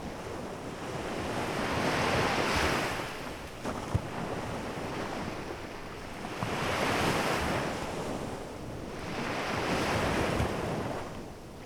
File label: Playa Del Carmen Beach Sounds; Ocean Sounds; Splendid Playa Del Carmen Beach Sounds